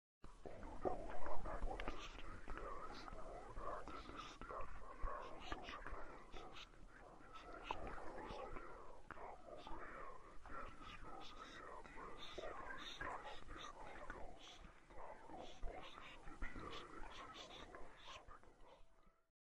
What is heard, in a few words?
Voices; Barotraumatic; Teleglitch paranormal